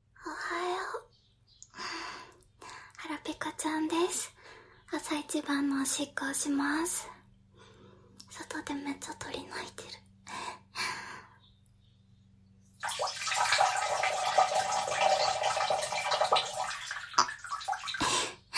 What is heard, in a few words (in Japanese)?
朝いちオシッコ; おはようッ！チュンチュンッ